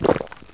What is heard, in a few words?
beer; svencoop; santas workshop